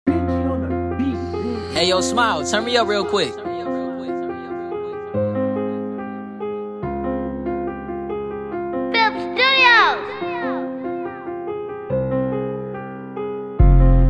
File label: waves